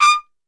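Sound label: D4 trumpet shot, Brass Super Section; Best Service; TRP SHOT; 90 s Sample CDs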